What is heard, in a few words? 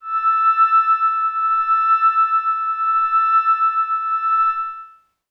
Glass Howl Kawaii A5; pads